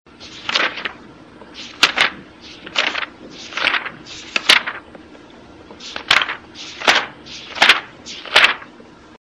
Pages livre

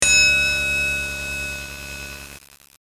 Ascenceur cloche

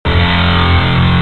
Guitare; bruitage; Instruments muzik

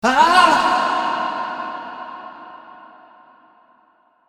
Aah men, Cris et douleurs; bruitage

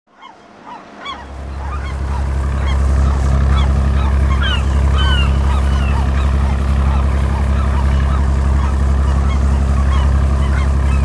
Port de peche; bruitage

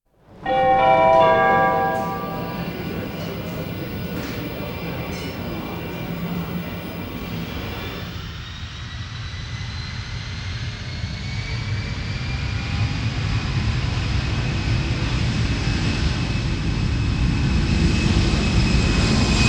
Aeroport et decollage avion

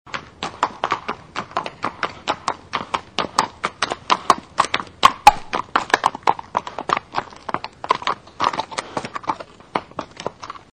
Cheval pas; Ombres du passé

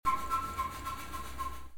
Billiard bleuage de la queue

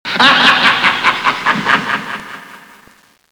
Rire demoniaque; bruitage

